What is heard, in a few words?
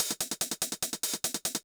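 drums, Hardcore Breakbeat, 145, C minor; Big Fish Audio; Hardcore Breakbeat Action; Decimater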